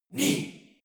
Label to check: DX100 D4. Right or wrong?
Wrong